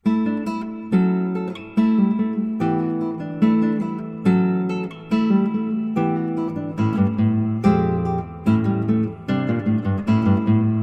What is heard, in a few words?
Fanfare, guitar